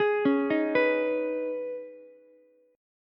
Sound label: Db 7th Suspended 2nd, Ukulele; JGuitar